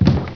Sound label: HIT FLOOR